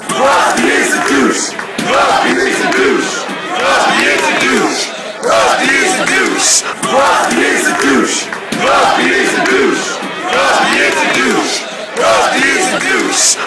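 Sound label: chant, football chants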